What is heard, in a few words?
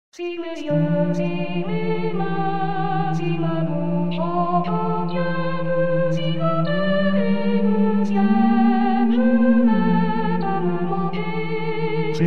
bass